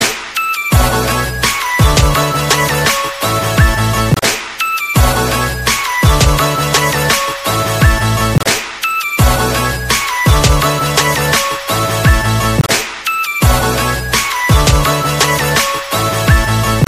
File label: nokia a1plus ringtone